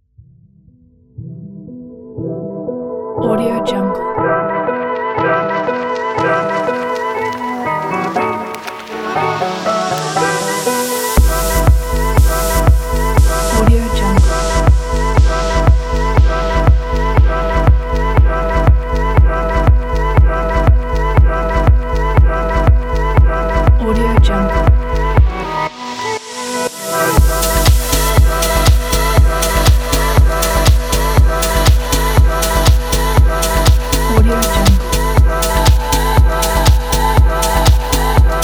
techno

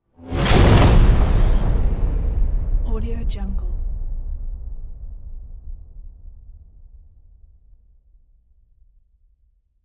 cinematic trailer sound

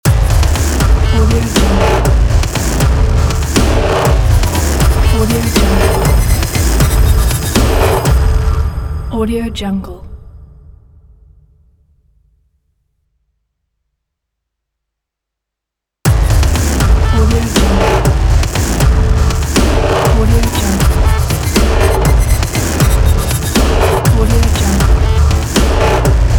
industrial electro logo

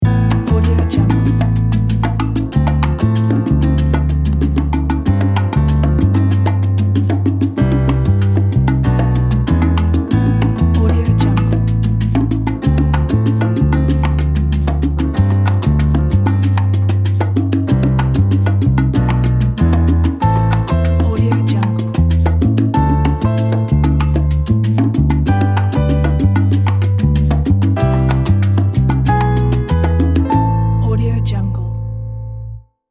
romantic latin

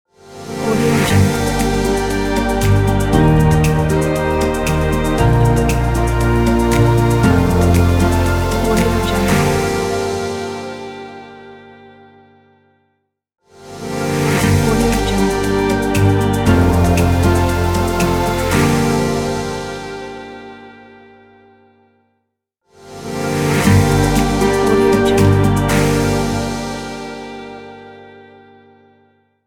sad acoustic folk logo

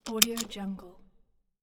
car seatbelt unlock

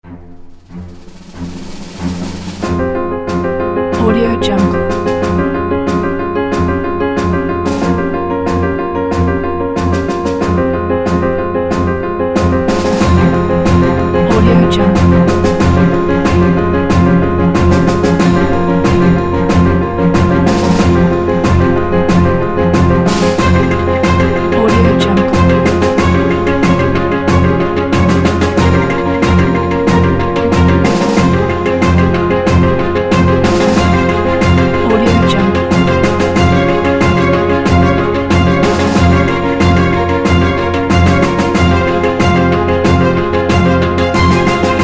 fast alarming furious anxious piano

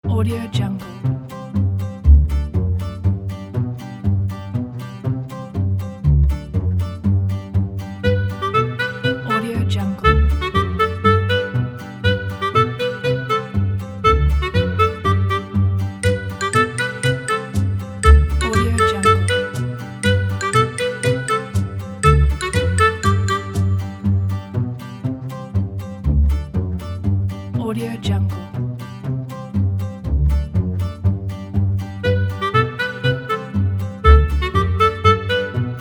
children animation

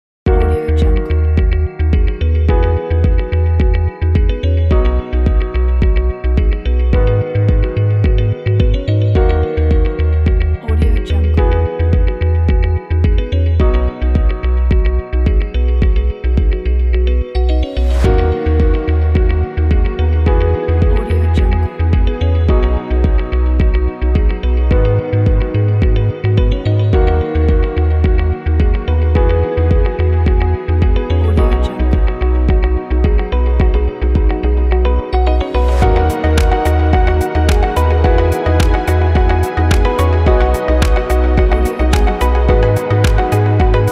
tutorial background

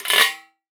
spring roll down jagged sheet metal; esmteam

